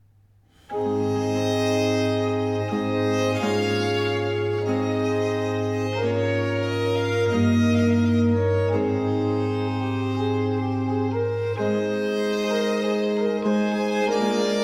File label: Sonate 3 in B-flat major - 1. Grave; Arcangelo Corelli; Corelli - Complete Works; Klassika